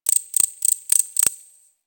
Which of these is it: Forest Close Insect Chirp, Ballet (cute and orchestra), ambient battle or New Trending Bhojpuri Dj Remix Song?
Forest Close Insect Chirp